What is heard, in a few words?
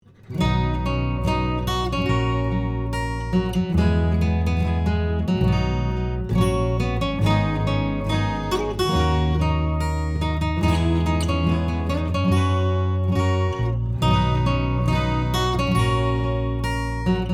yukinonaha guitar